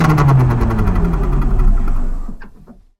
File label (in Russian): tank ostanavlivaetsya dvigatel glohnet; zvuk voennoy tehniki